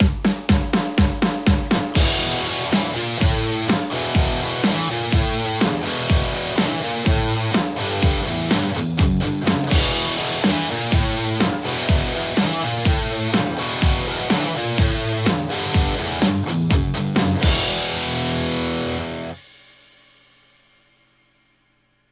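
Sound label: Telecaster; tse x50v2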